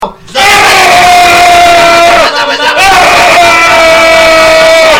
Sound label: 5 second a capella yelling song; shortsongs